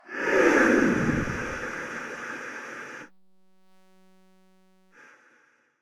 Devil Passby

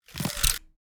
Grapple Hook Load Trigger

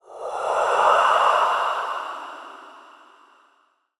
Ghost Voice Long

